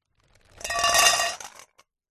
food candy boiled sweets unwrapped pour from paper bag into metal scales